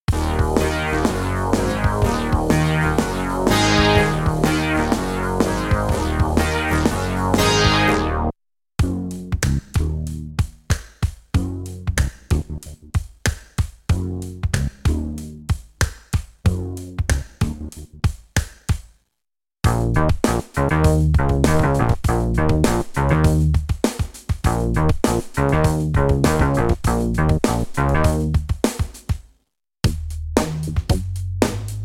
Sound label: aggresive edges bass